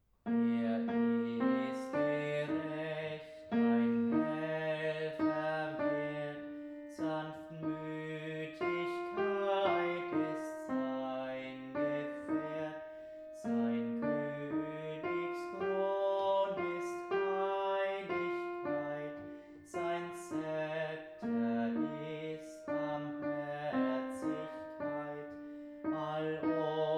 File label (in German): Macht hoch die Tuer Alt